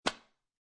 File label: Deodorant Stick Remove Cap